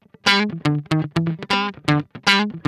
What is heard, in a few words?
GUI; Best Service Gigapack 2